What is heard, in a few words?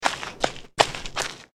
walk; stick panda